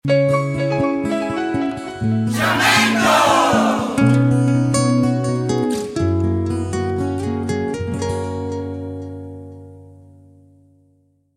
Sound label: Jingle, ukelele